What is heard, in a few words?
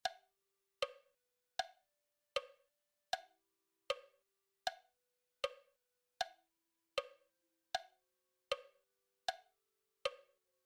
tenors; telemann laudate jehovam part3